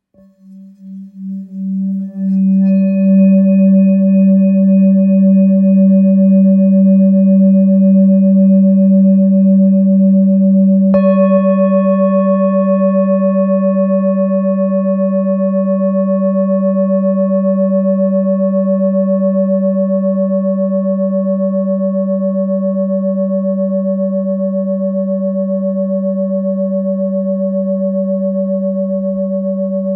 Bol bengale do diese

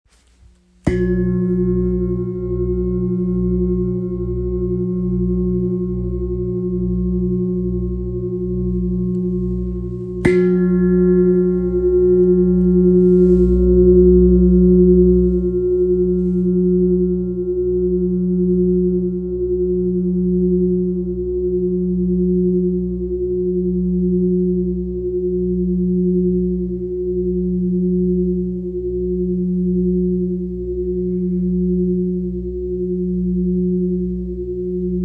Gros bol grave la dièse, Bol Chantant tibétain; Bol Chantant tibétain artisanal pour la douche sonore gravure pieds et chakras Note La dièse

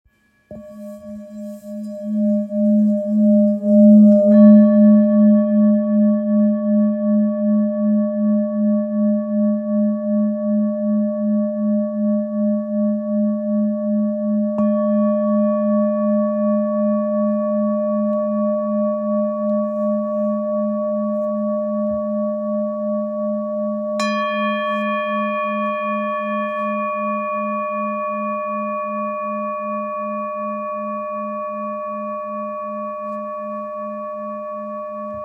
Bol sol diese 980g

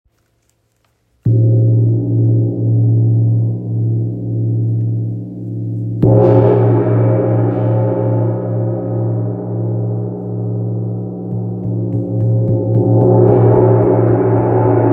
Wind gong 60cm ré dièse

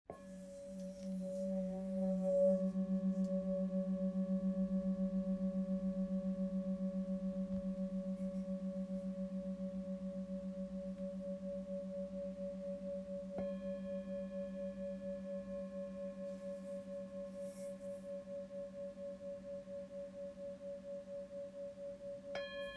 Bol full moon do diese 844g

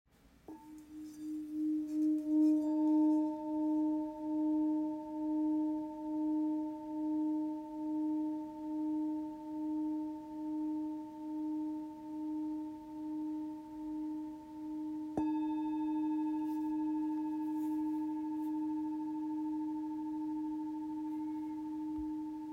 Bol real FM re diese; Capricorne 660g

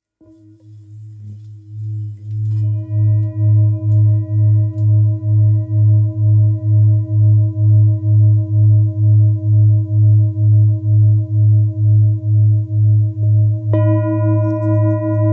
Bol bengale sol diese

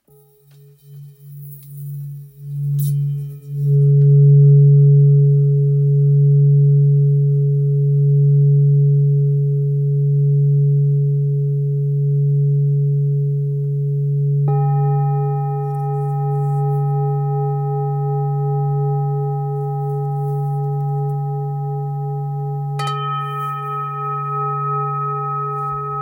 Bol bengale do diese

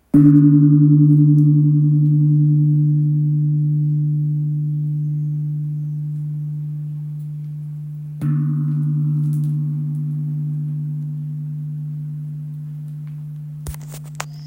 Gong birman Re 3.2kg

